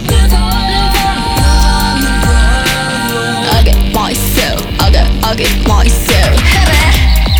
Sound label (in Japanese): マスタリングあり